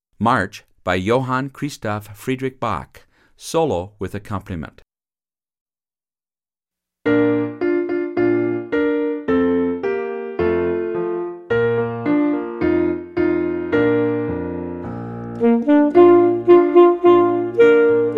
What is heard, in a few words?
Solo and Accomp; Solo with Piano Accompaniment – Performance Tempo; March